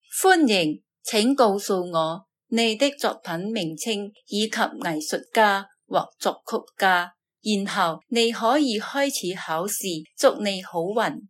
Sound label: Cantonese performance piece